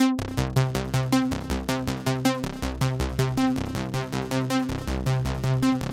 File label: limit